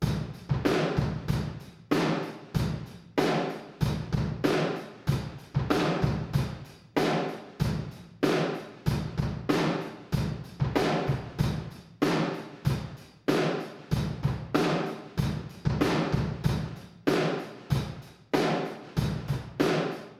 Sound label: ayotte standard kit talkback mic; toontrack the warehouse rock sdx